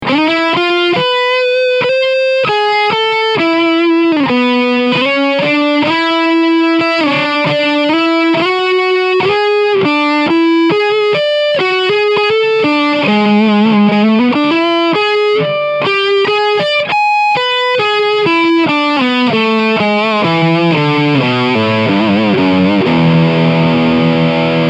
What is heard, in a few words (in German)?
Leadsound Steg PU